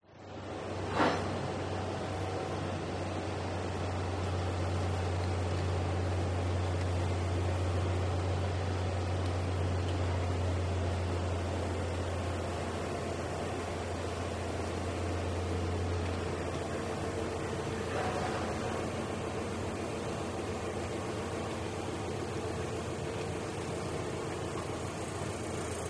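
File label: industrial construction site diggers digging soil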